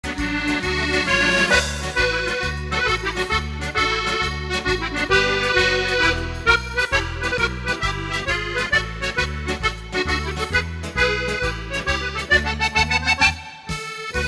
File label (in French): Defile Des Accordeonistes